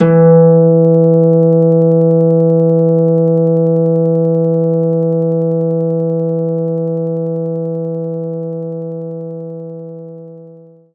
Orchestral Harp E3

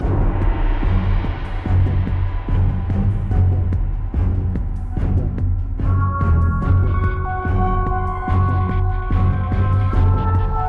Combat Medium Threat Loop

